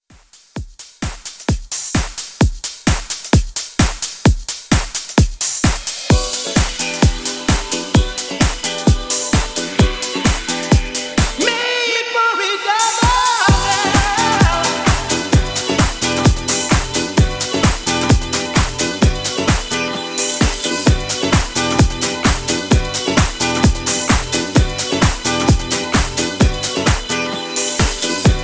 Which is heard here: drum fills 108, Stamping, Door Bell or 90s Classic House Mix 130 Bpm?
90s Classic House Mix 130 Bpm